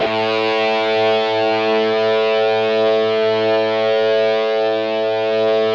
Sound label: DIST GUIT, GIB SG, A2